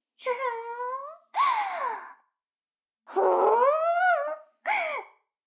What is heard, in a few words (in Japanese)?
シコシコ音声, ASMR; 逆時間停止されて